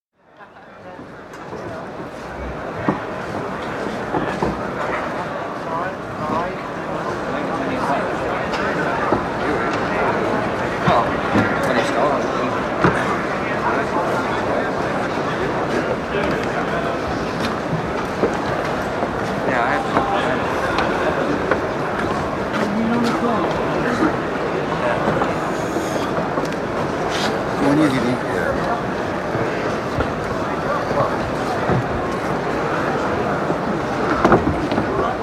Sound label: General atmosphere before contest, Royal Albert Hall; Crowd Sound Effects; BBC